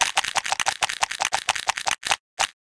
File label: Chomp Fast